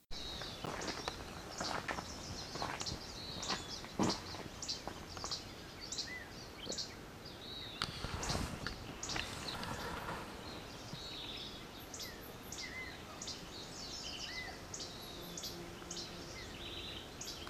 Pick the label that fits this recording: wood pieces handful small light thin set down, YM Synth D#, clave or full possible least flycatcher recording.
full possible least flycatcher recording